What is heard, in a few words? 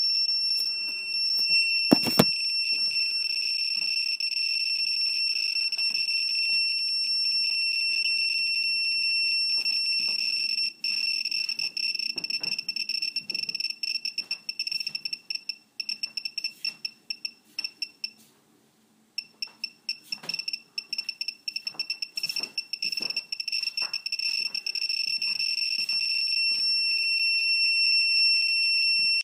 Geiger